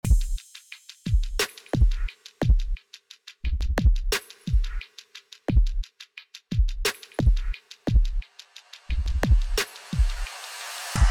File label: drum; Soundraw